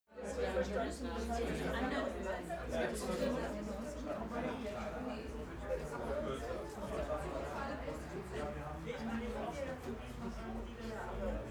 walla, Gastronomie

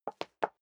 step high heels wood parquet scuff; schritte

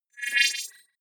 wobble swell constant short

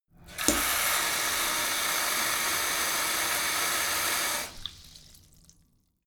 sink fill splatter hard short; haus-garten; badezimmer

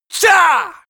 male attack, schreie; menschen

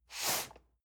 cardboard box medium slide short; objekte

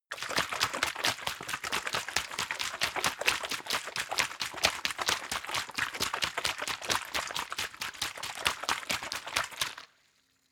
bottle water shake long; gastronomie; berufe

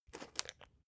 glass pepper open cap; berufe; gastronomie; soundpool

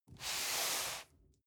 cardboard box medium slide short soft; objekte

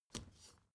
step ladder wood grip single; schritte

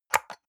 soap dispenser press empty short; haus-garten; badezimmer

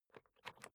briefcase plastic latch lock key turn

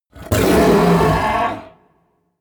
coloss scream deep; kreaturen

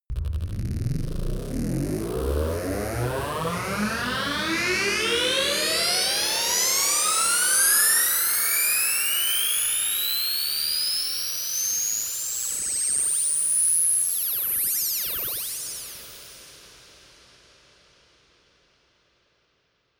pan mover, risers

